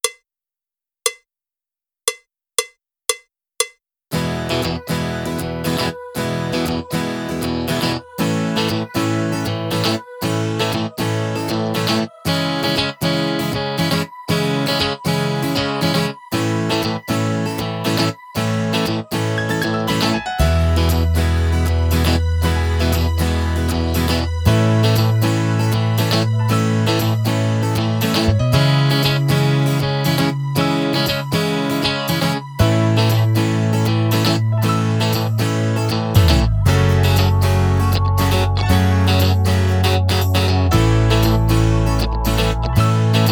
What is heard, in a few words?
drumless